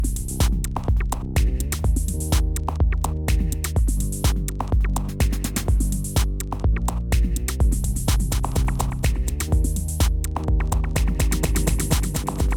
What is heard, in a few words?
Echo